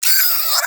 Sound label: Cryptilex Vocal Pain; Characters; Warframe; Hunting Critters